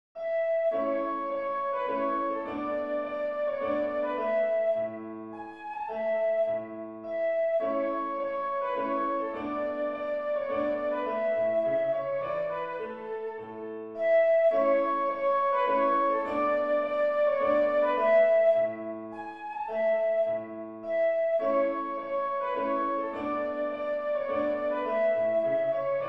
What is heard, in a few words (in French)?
danse allemande, la majeur